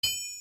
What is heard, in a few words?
hit Wall; App Inventor 2魔法APP設計創意班